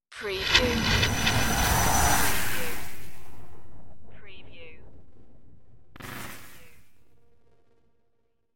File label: dimension power glitch